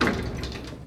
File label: broken elevator closed r; lift